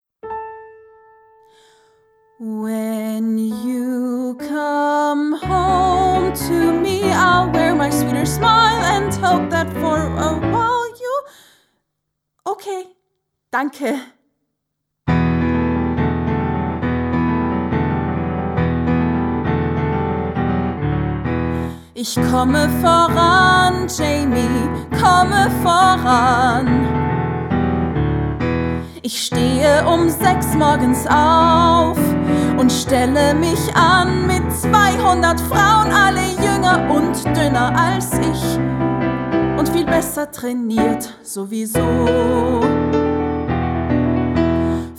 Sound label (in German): Gesang (DE/EN)